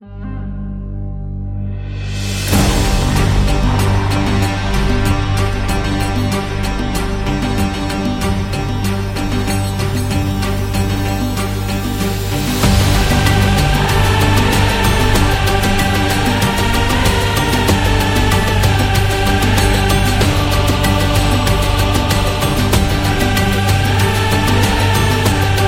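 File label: Middle East, epic cinematic trailer music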